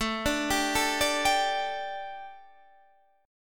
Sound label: A 7th Suspended 4th, chord; x,24,24,24,21,21,21,x